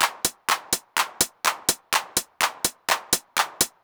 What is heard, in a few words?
Snare Loop, Drums, Dance Beats